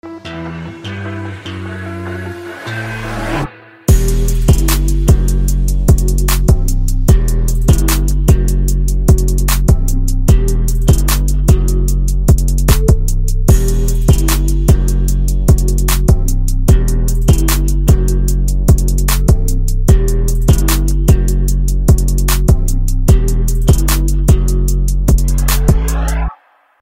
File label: Instrumental Ringtone